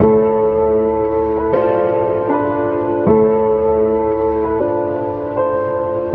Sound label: piano, Loops Sad